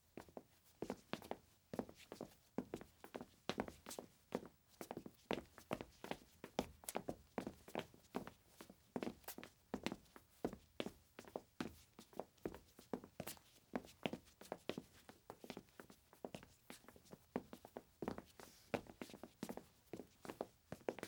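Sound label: Footsteps, Group on Hard Surface; Blackmagic Fairlight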